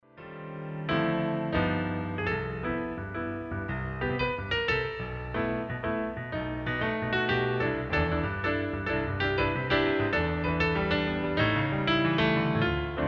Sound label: PIANO SOLO BOSSANOVA